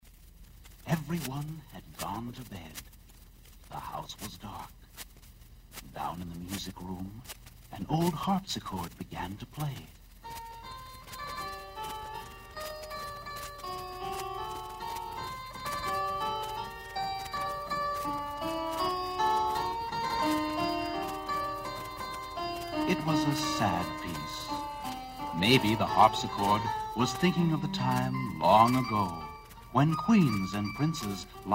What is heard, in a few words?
piano; Kiddie Rekord Music Library